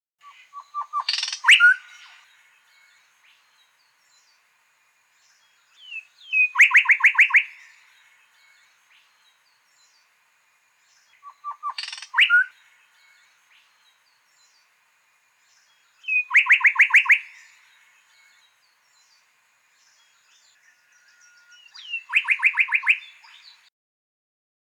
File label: little shrike thrush